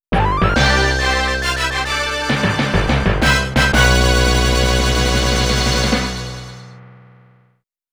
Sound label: Area Clear Fanfare; Ganbare Goemon; Sound Treasure Box